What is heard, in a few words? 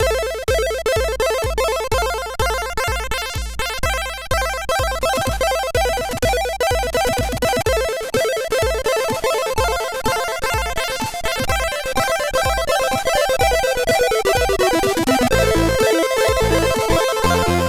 Beats tune, sid CSG8580R5